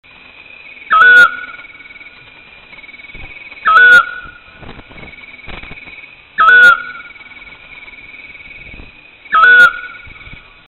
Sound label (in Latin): otus scops